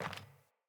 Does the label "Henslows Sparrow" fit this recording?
No